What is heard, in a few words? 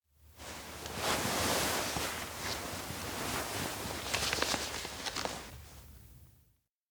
paper under pillow rustle; anemoi